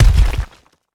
Boss Hit; MiSide

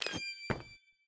Jump; ChibiMita; MiSide

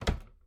Close Tumb; Game rip; MiSide

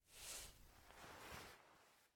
Player Start Move; Game rip; MiSide

